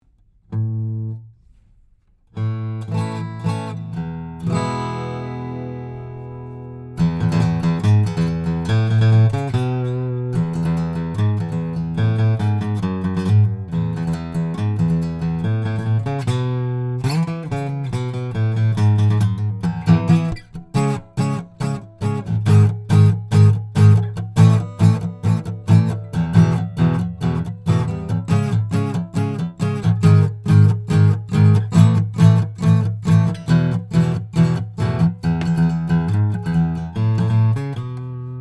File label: Acoustic Guitar